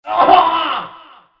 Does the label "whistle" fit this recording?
No